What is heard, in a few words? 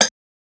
Hats, Alesis Performance-Pad